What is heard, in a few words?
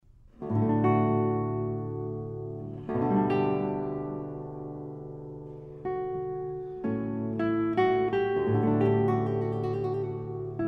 Cello Suite No1 Sarabande, fragment; Johann Sebastian Bach